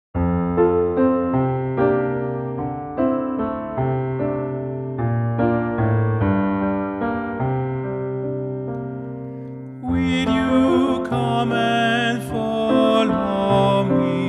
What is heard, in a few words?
will you come BARYTON